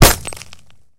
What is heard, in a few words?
hit cockpit glass; War Thunder